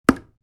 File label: Dartboard impact dart hit; sport